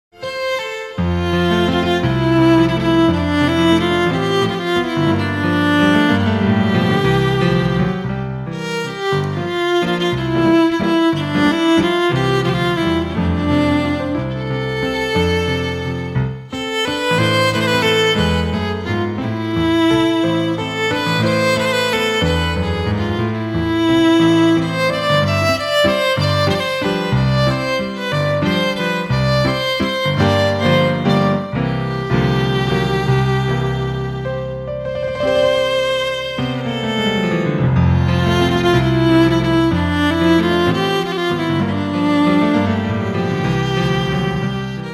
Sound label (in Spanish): piano y violin